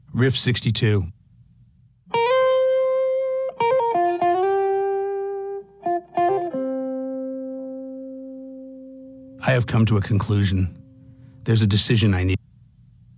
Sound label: Riff